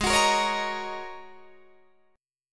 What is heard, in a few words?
G sharp 7th Suspended 2nd Suspended 4th G sharp Guitar DADGAD